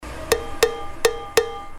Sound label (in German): glocke